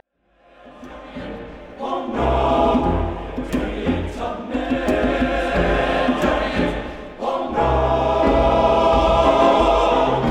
TTBB

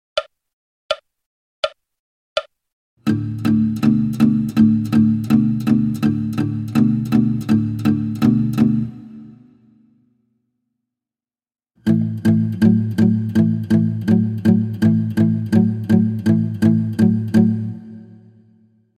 fingerstyle

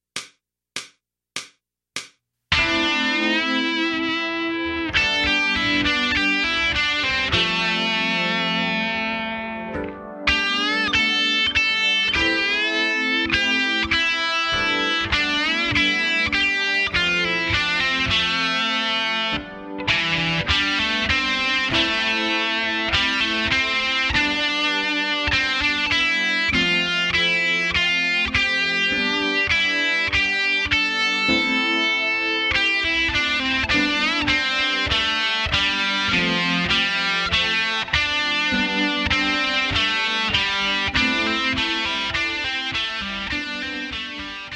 guitar method

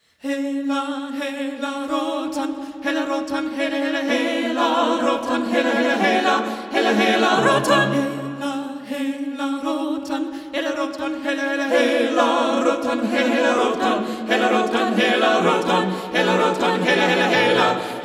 SATB

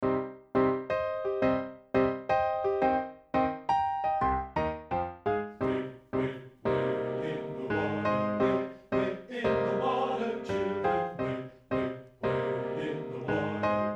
WADE IN THE WATER ALTO

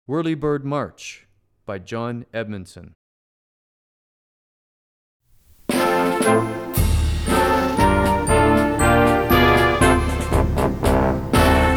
March

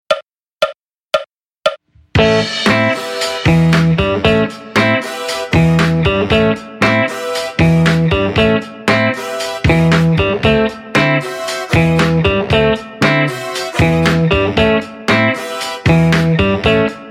RnB Guitar

